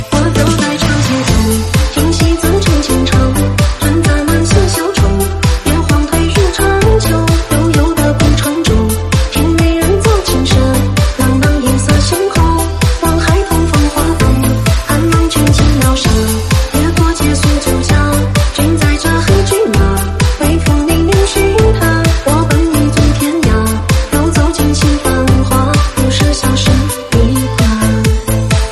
Remix Tiktok giong nu DJ Pad Remix

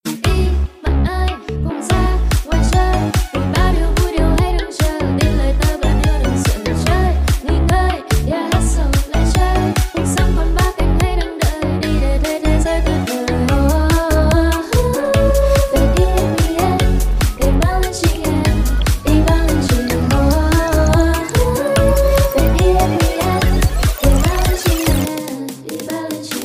Nhac Chuong Remix

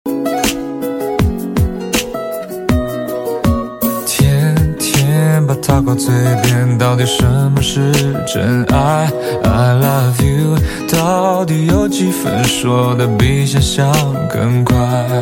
Nhac Chuong Tieng Trung

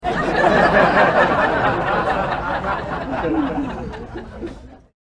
Tieng cuoi khan gia

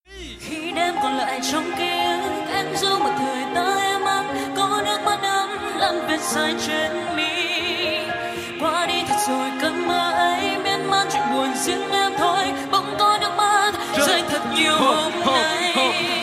Rap Viet

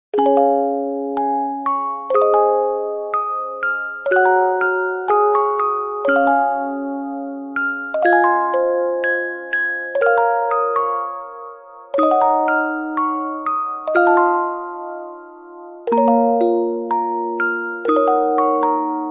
ringtones không lời; ghibli

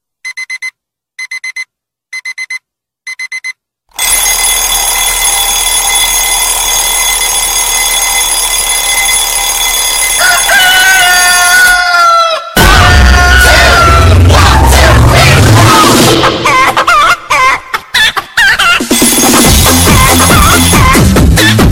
bao thuc ba dao remix

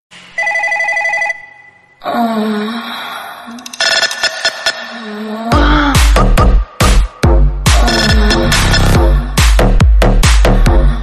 Nhac Chuong Dien Thoai Reo

